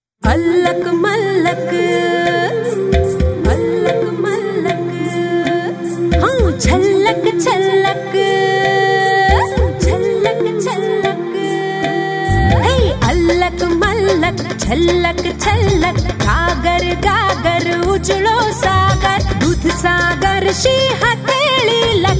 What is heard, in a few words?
Jingle with Voice; Dudhsagar